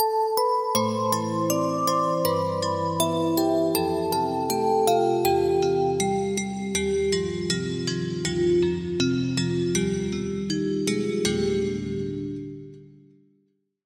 Bell - Big Bell; Routed Sound; Serum 2 Expansion; Story Walker Vol.1

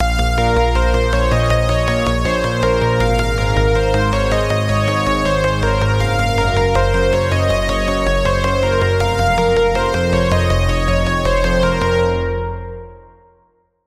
Pluck; Routed Sound; Sylenth1 Expansion - Reality Maker Vol.1

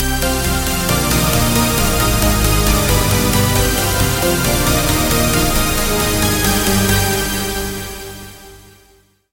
Key, Sylenth1; Routed Sound; Sylenth1 Expansion - Reality Maker Vol.1

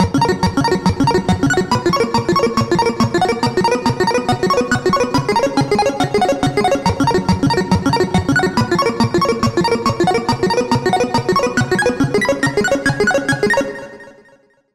Lead; Pixel Duck; Routed Sound; Vital Expansion - Hyper Gamer Vol.1

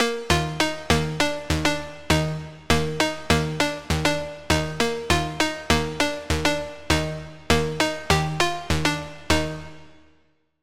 Key, Sylenth1

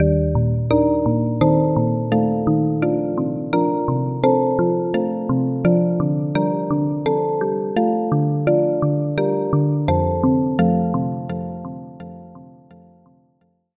Key; Routed Sound; Sylenth1 Expansion - Story Walker Vol.1

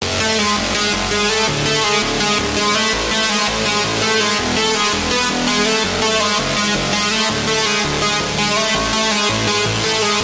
Lead; Thousand Years; Routed Sound; Serum 2 Expansion - Flame Summoner Vol.1

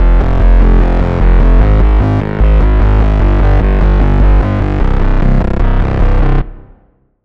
Bass; Custom Map; Routed Sound; Vital Expansion - Hyper Gamer Vol.1

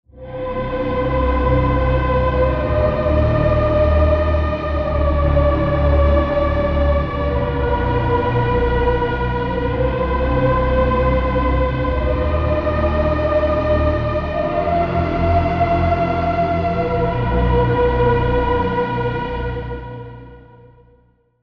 Pad; Mind Field; Routed Sound; Vital Expansion - Chaos Bringer Vol.1

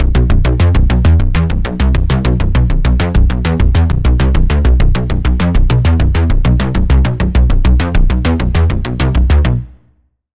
Pulse; Routed Sound; Sylenth1 Expansion - Quantum Enforcer Vol.1

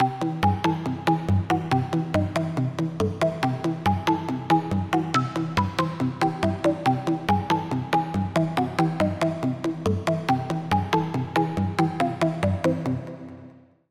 Pluck, Sylenth1

